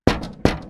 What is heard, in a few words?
glass ton; easyrpg; Ib